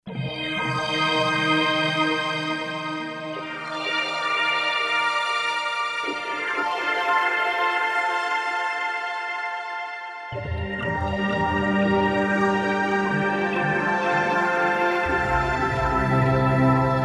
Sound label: RainPhase, Korg MSC-2S Synth; Synth 1